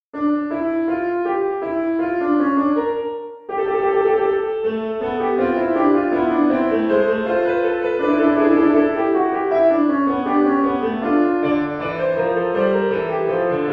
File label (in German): Fuge